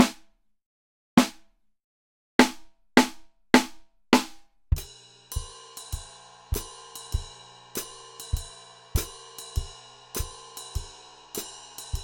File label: Jazz Drums; Intro To Jazz 3; drum track to play along with